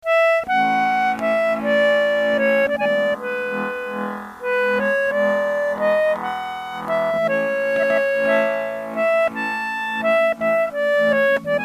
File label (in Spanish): flutina